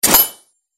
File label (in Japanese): 短剣 金属音; モノラル